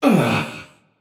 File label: ugh, voicepack; smite; jorm